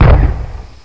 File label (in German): feuerwerk bang; funrun